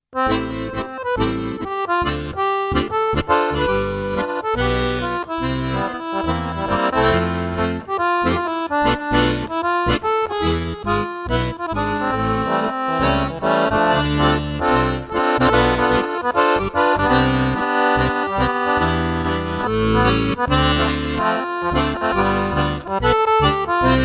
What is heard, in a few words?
Jazz